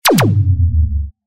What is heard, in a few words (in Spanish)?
disparo